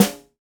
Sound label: Jazz Snare; Snares